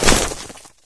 ice, player footsteps

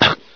scientist die; hl1; Source engine

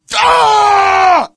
crit death; video games; source engine; tf

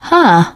positive noise, teen girl, survivor voice; source engine; left 4 dead 2

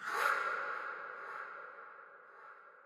amb crow; source engine; portal2; birds

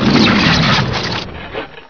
turret die; Source Engine; bshift

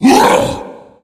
growl; video game; Left 4 Dead; Source Engine; tank; tank spot prey

